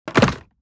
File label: Platform wooden game menu ui confirm